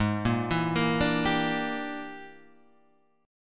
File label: E 7th Sharp 9th Flat 5th Ab, chord